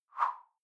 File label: whoosh simple slash big high